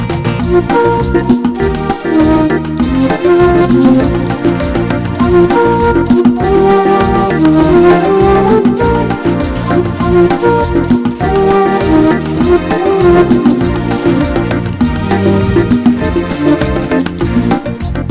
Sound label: A Beautiful Samba